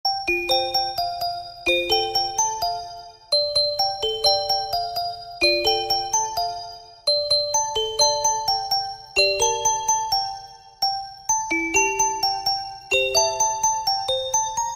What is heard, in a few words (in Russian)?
krasivaya melodiya na zvonok telefona na novyj god; Красивая мелодия на звонок телефона на Новый Год